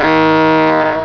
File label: honk